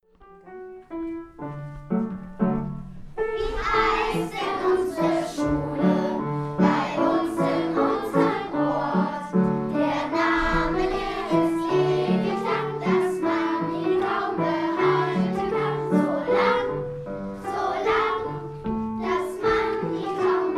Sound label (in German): Schul Hymne; Fulda